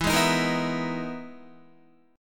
E-Major Sharp 11th E, Guitar; EM#11 strummed

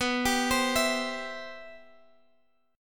C Major 7th, B, guitar chord

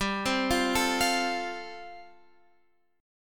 G 7th Suspended 2nd Suspended 4th, Guitar Open F; G7sus2sus4 arpeggiated

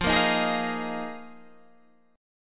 D sharp Suspended 2nd Suspended 4th

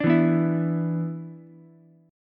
B-Minor Double Flat 5th, Ukulele; Bmbb5 strummed; JGuitar

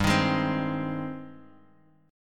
G sharp Major G, guitar chord; strummed; JGuitar

